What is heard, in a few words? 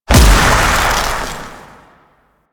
Impact Medium, Weapons; Duviri; Incarnon Adapters